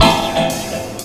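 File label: PIANO chord, Ragga & Dub Reggae; USB Soundscan vol.27; AKAI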